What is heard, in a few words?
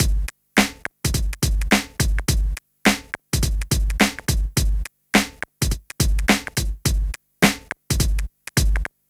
Club Tip, R&B Drums